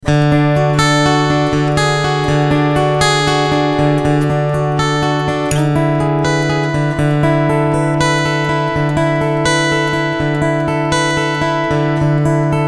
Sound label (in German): Bass Mid Boost mit CoolTube